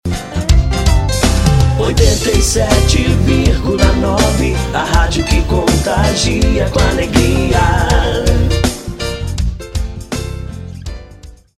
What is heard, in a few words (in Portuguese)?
vinheta cantada